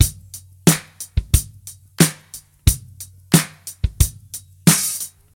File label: 90 bpm drum groove b key; drum loops